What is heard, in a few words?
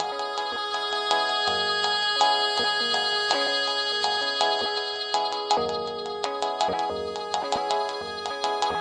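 mellow plucky loop, 109 bpm; MELODIC LOOPS; STRINGS ORCHESTRAL CLASSICAL LOOPS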